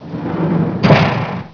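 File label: door open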